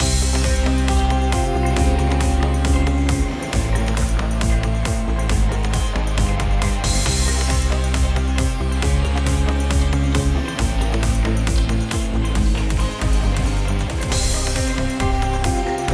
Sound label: Base Music Loop; Action Ops Snow And Sable